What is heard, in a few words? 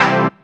dance chord